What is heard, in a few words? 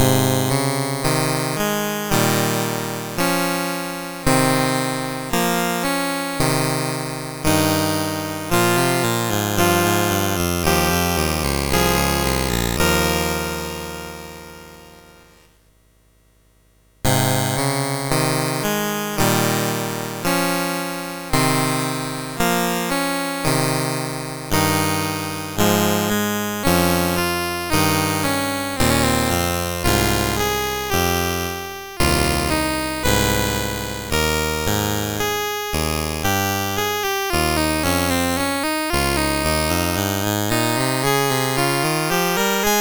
Keyboard Piece A Flat, MOS6581R3; No6